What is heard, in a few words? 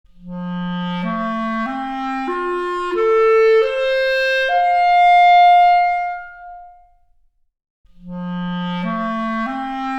Clarinet arp, Stereo input